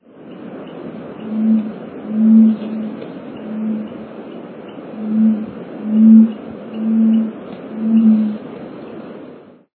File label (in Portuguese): pavo